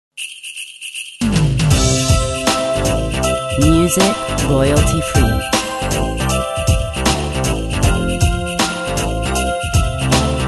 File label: Jingle bell pop